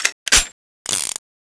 clip in

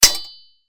knife hit wall; weapons, ButterFly

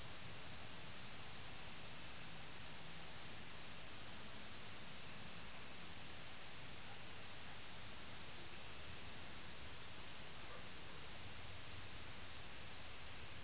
crickets; ambience

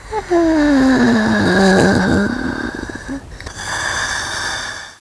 light stun, zombie; Zombie Darkness

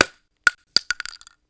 shell

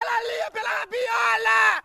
knife kill; flash new knife

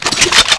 infi draw, weapons

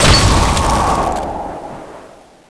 frost explosion; zbg; addons

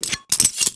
butterfly knife inspect; czero; csgo

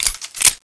elite left clip in, weapons

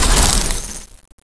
debris

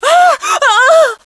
ahh

